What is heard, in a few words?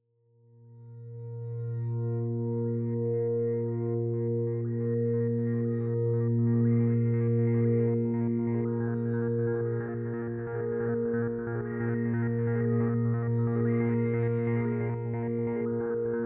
Ambiance